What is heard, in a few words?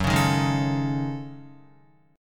Gb Minor 6th Add 9th Gb down Guitar